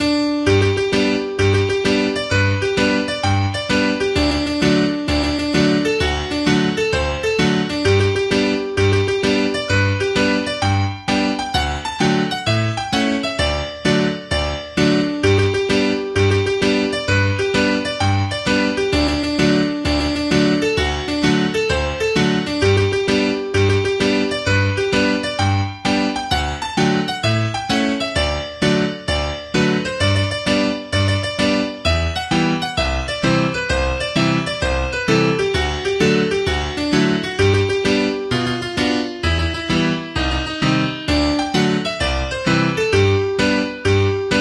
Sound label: Trumpet Hornpipe